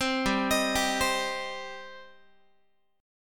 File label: G Suspended 4th Sharp 5th, Guitar; arpeggiated; 4A,4E,5A,5D,5G#,6C#; 15,15,18,17,16,x